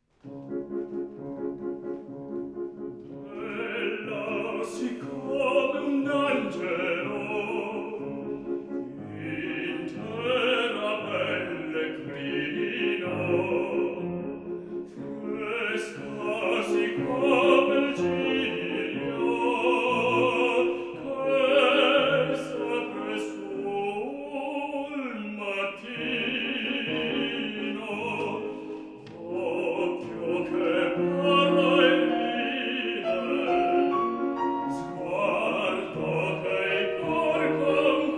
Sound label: Bella siccome un angelo, Bariton; Donizetti; Meisterkurs